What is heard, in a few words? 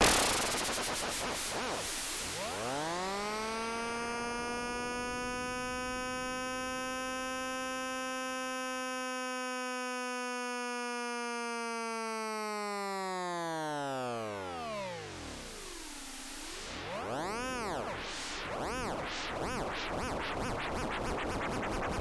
Lfo On Rate Of Lfo Siren Rise Fall